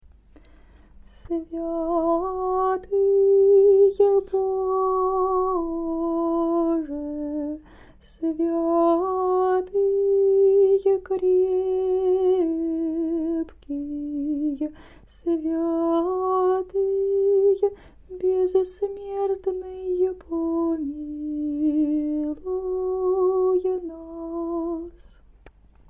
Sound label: trisagion funeral, singing